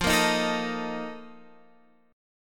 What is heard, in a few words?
A sharp Major 7th Suspended 4th